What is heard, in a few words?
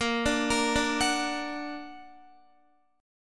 A sharp Augmented Guitar; A#+ arpeggiated; JGuitar